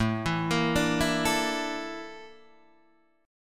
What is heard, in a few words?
A sharp Major 7th Flat 5th, chord